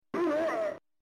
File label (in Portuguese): grito; mamon haggar final fight